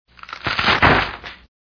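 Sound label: branch snap; the 39 steps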